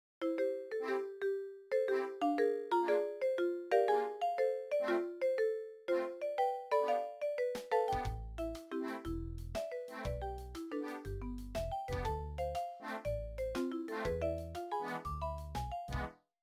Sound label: marimba